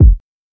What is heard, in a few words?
Kick